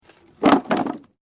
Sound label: WOOD PLANK MOVEMENT